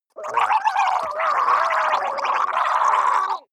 water monster female gargle scream hard, comedy cartoon; soundpool